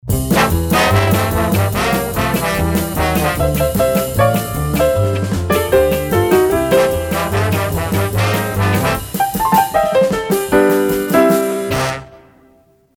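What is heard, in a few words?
Signature - Instrumental, jingle; WGH; (Instrumental redo of Signature)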